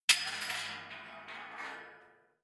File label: shells 20mm hull